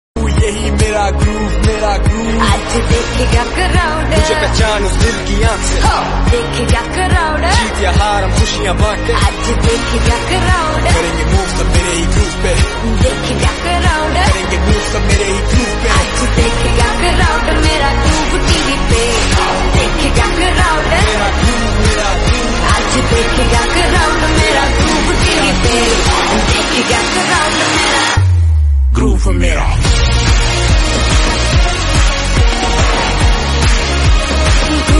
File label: Anthem Ringtone